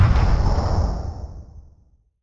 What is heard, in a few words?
boom; SMACDEMO